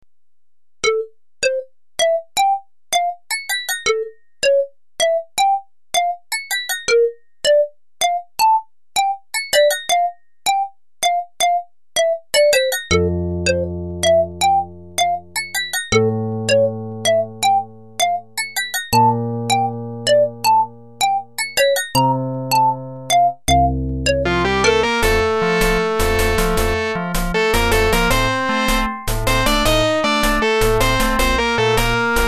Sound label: bgm